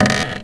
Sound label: Coconut Fall; Finding Nemo; XBOX